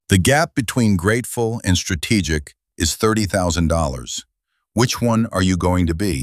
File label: briefing beat; lowball offer